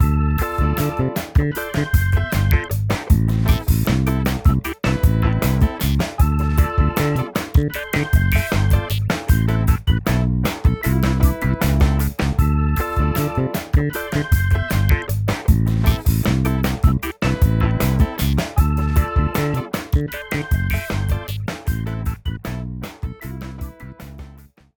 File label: Post-Question Loop; Jackbox Games; The Jackbox Party Pack 1; YDKJ